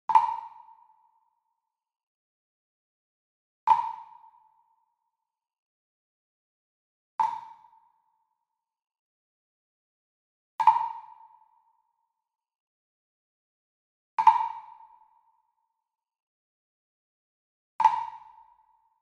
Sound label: Sweetener Jam Block Plastic Flam Medium; boom library; Cinematic Strikes Construction Kit